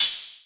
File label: oh; drumkit; switchangel